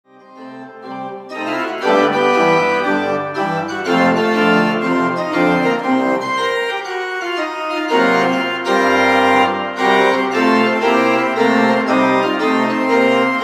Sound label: heavy metal church blues